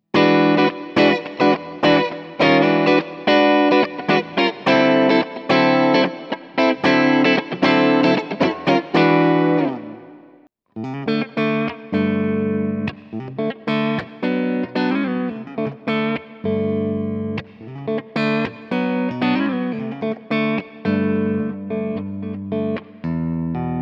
Walrus Audio Mira Compressor Clean Referenz

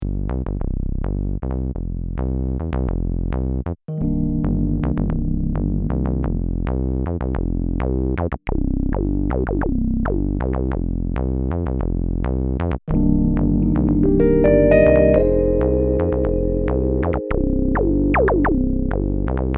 Bass und Rhodes

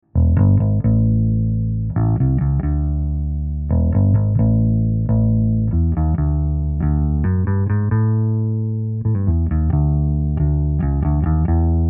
414 EBass Niere

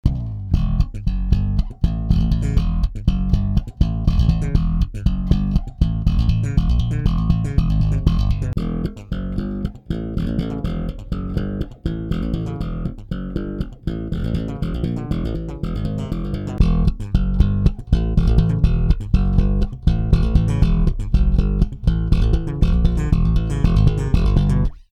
Funky Popping, 3 Pickuppositionen; SKC Bogart

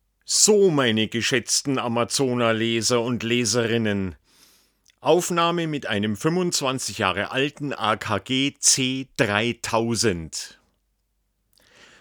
OHNE DeEsser SPL 2230

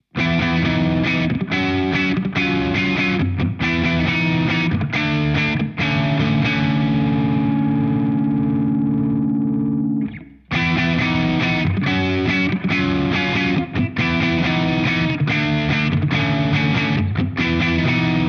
Walrus Audio Ages Alle Stufe 5 Gain Spektrum

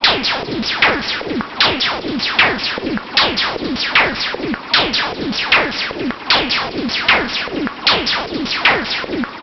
MOOG Mavis B960 Sequence durch ES Black Hole DSP PercGroove

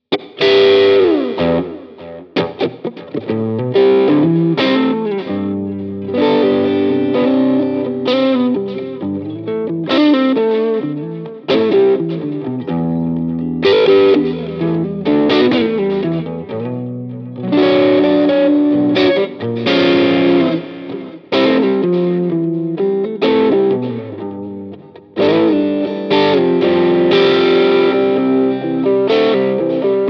Floorboards im Vergleich Crunch; Headrush Core Prime

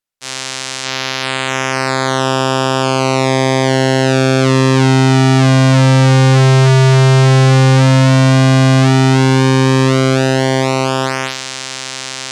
Oszillatoren Pro 2

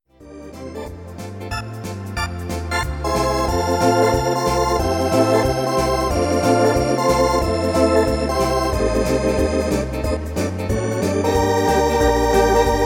Orgel Midi Tasteninstrumente

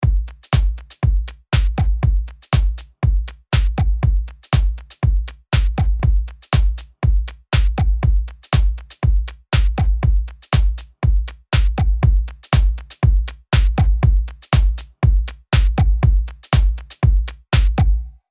Magneto Vergleich

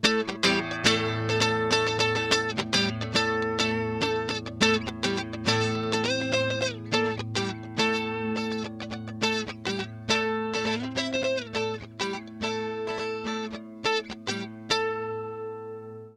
Flanger Gitarre Subtle Flange

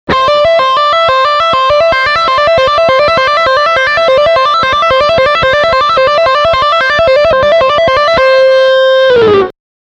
Gitarre lernen Legato

